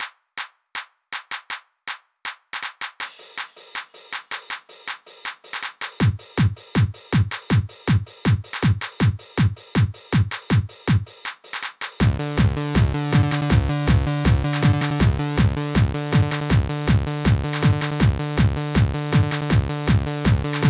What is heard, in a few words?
pigeon BPM160